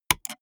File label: gaming mouse left click single hard